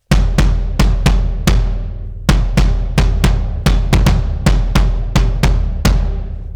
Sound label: kik drum; pitchshifter PSI